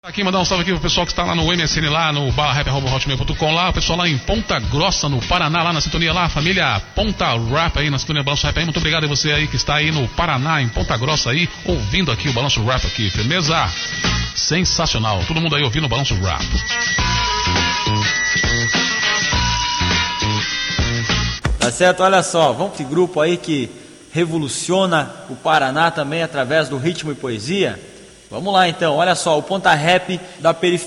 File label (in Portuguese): Rap Hip Hop; Balanço Rap e Sintonia Hip Hop